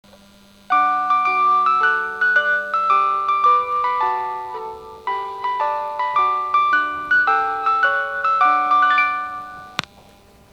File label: chime; hokkaido